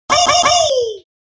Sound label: ayaaaay